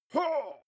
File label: male shout hit soft